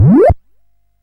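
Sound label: Nebulus, MOS 8580 SID chip music, games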